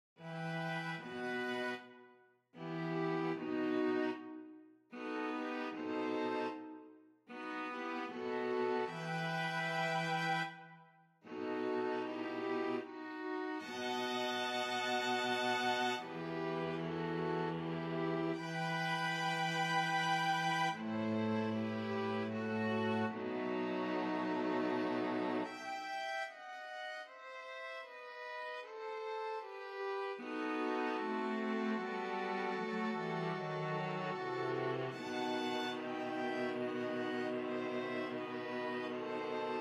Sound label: string quartet